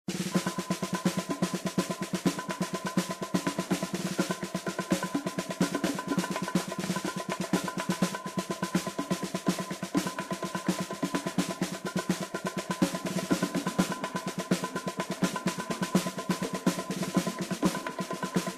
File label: music playing with danger; source engine; tf